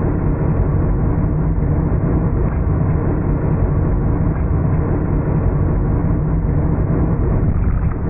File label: P51D roll; mustang